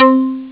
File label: Perco